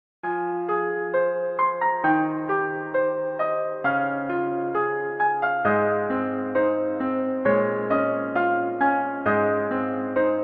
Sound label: sad piano